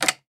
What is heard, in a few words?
lifting receiver